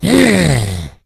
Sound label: zombie alert; justzombies